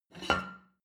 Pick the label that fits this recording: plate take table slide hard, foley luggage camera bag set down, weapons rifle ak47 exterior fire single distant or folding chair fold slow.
plate take table slide hard